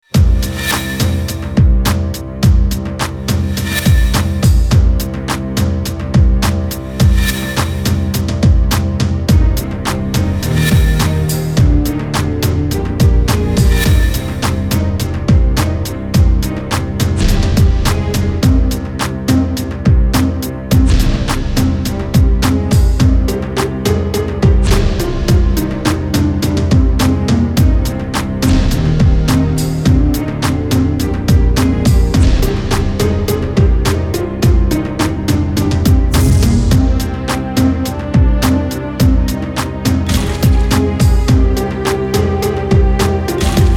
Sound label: Music Ads